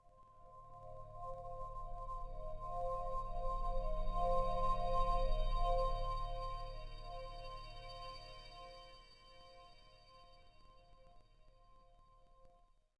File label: Icy Pad; Ambient; Sid Meier's Civilization 4 Complete; Beyond the Sword